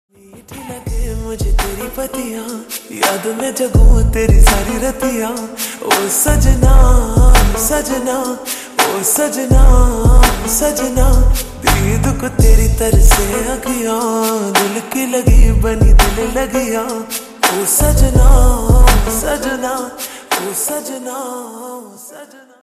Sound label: Slowed Reverb Ringtone